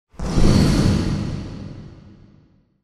DOOR METAL

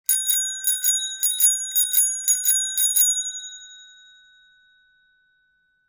BELL BICYCLE

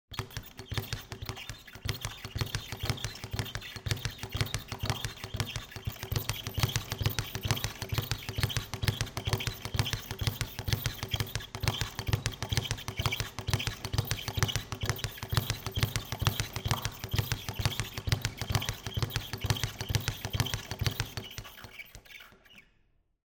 BOXING GYMNASIUM

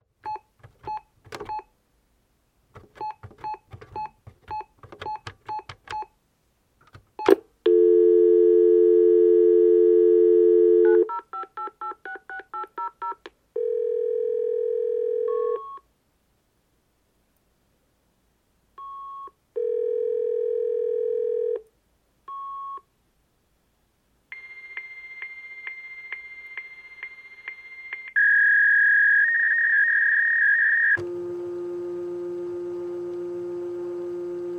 TELEPHONE FAX MACHINE

